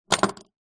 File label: household padlock metal med heavy set down on solid wood surface